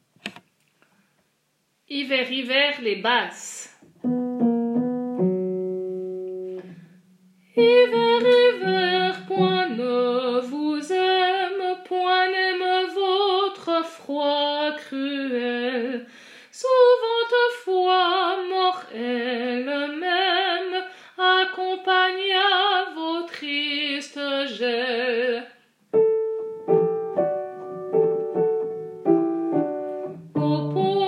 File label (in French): Hiver Hiver Basse